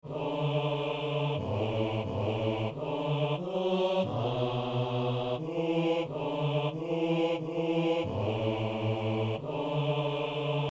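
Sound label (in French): vienne ton règne o dieu notre père, basse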